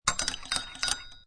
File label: Stirring In A Cup With A Tea Spoon; Drink Mixing; Lynne Music